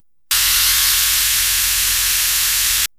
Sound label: map zooming, digital